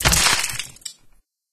Gore

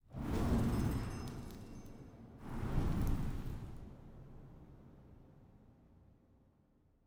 Brand Sound

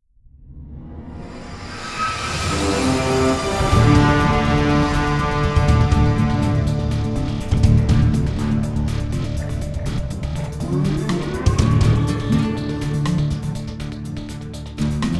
Music Low Tension Inspriational; starcraft 2 wings of liberty; MissionMusic

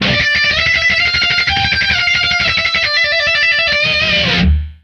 Guitar Shred

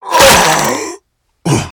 death; Voices shitonos

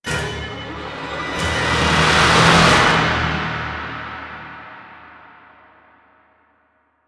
Stinger Suspense Short Intense, game soundtrack; Pillars of Eternity

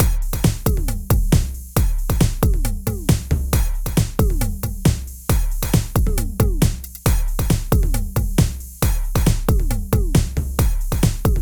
Robot Dance

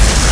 fire laser Loop

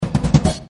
Mastery; yet another pixel dungeon android

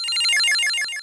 Synthesis; attack moves; pokemon; gen 2; gsc

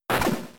Bite; Pokemon; Gen 3; attack moves; RSE

